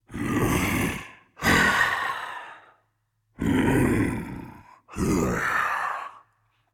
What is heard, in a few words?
breath, voicepack; smite; jorm